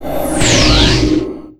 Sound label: Hawk atk; Fly For Insanity